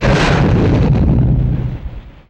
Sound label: Explo Underwater; Destruction